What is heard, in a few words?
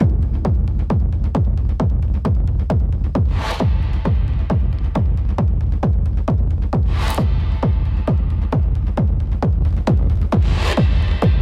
Frequency Anomaly